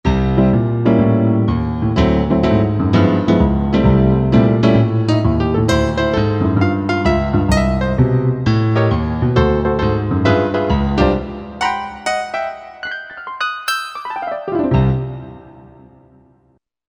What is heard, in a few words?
Vel. Grand, Korg MSC-8S Percussion